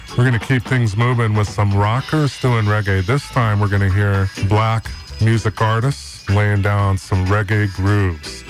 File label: rockers reggae